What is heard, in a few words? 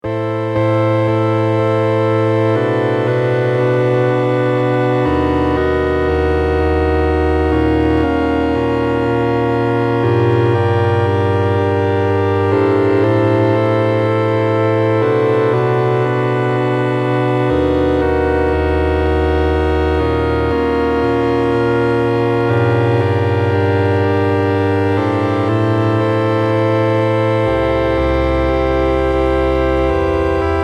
organ